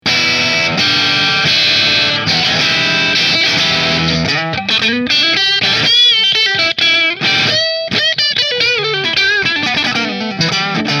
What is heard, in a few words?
Nola Voodoo Neck Split Marshall, guitars; New Orleans Guitars Voodoo Custom Dark Green